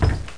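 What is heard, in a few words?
door close; tuerzu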